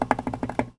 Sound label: Foley